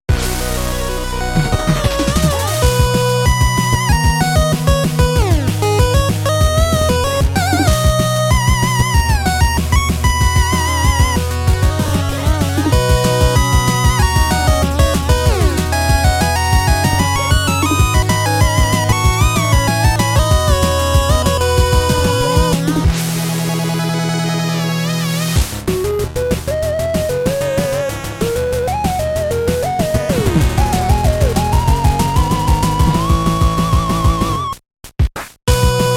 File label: 8-bit